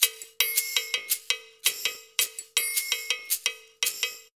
110 MIX, Percussion Loops

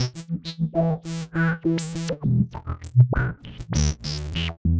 Processed Electric Bass; Sonic Foundry (Sony Creative Software); Modular Electronica; Basses

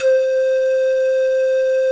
PAN FLUTE C4

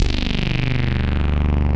SAW LEAD; Pro-Rec - Synthsonic; TRINITY

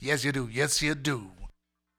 Funky Mama Vocal, 70s Analog Funk

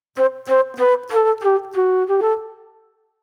Mellow Flute

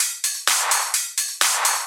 Hihat Loop 128 BPM

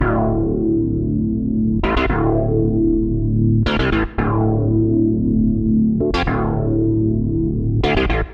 D Bass Vice, 115; Zero-G; Future Wave

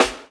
SD, HIP, Dance Mega Drums

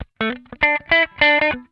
PICK IT, Funk Guitar; Zero G - Funk Guitar

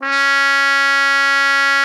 cup C#4, cup mute; Xsample VOL-3

